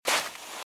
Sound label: Footsteps Sand Dry Walk Right; RadwinIsland